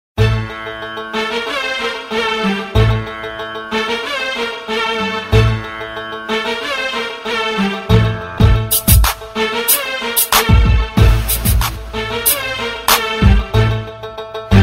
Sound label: Arabic